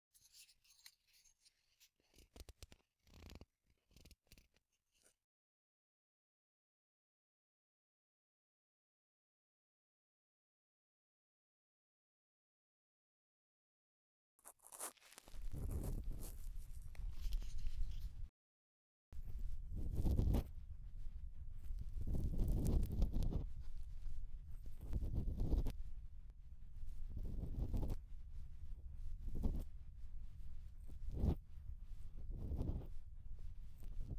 (右)竹耳かき 耳穴入口付近の音, ASMR; 耳かき音部位分けVer.

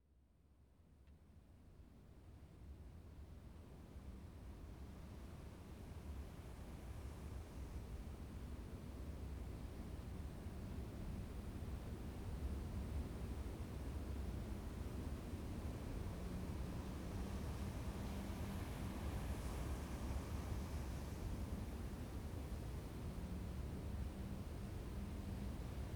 猫吠崎灯台・霧笛舎（霧笛の音）; アルジェ

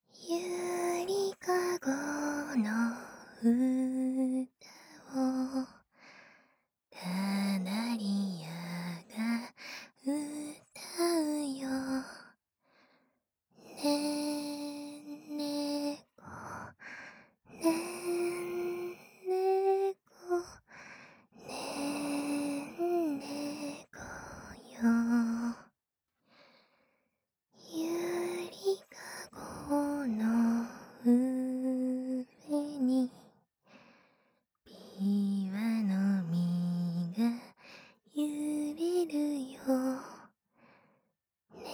ゆりかごのうた

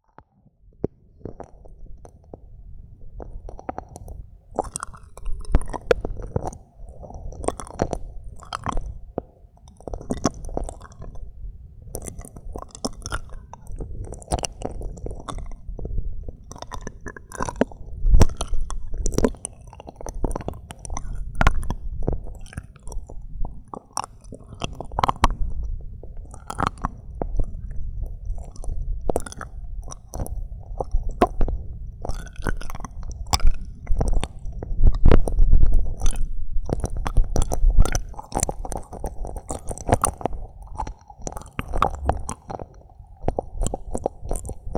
左耳奥舐め, ASMR

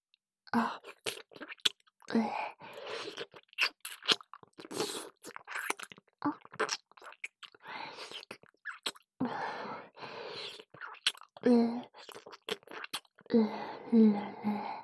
アナル舐め; おまけBGV

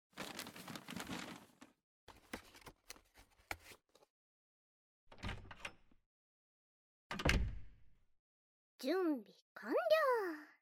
石鹸削りASMR; 石鹸削りASMR、やっちゃいます

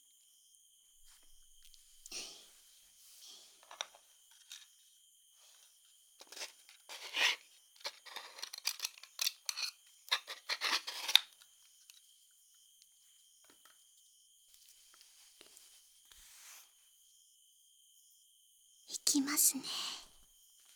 右耳かき; Japanese; みみかきにっき; 今日のできごと。

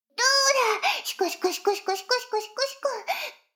シコシコ音声; 逆時間停止されて

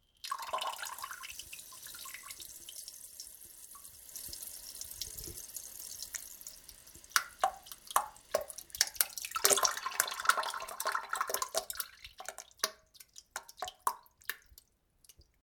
おしっことなんべん（おなかの痛さに気をとられてしまって雑音がかなり目立ちます）; ちょっとおまけ

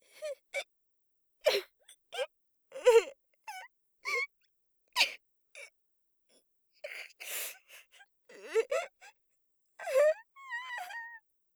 泣く; 効果音あり

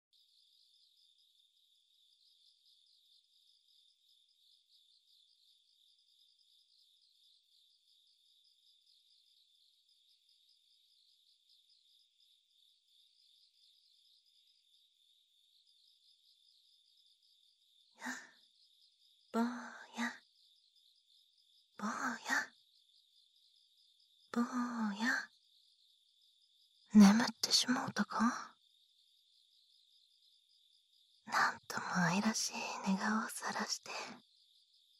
お耳かき, ASMR; 雛芥狐様のお耳かき

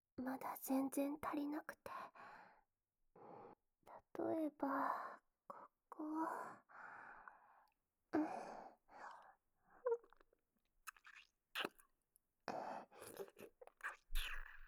首舐め 乳首舐め,ASMR

